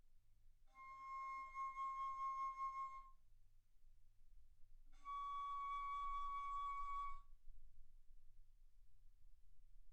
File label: Bass Flute C6 Db6; ff